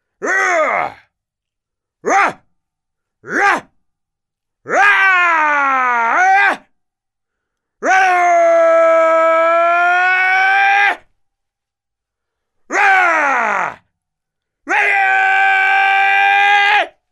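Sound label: sounds of people fighting and a punch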